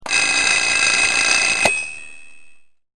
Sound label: ALARM